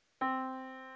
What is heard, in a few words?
Yamaha Disk, piano sound